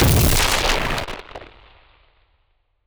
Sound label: Explosion Body; Go Record